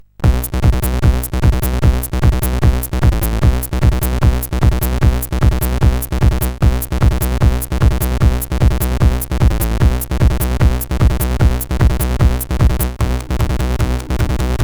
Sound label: Loop, sid CSG8580